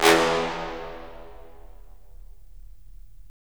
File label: BRS, Roland LA Composer; Roland - LA Composer vol. 3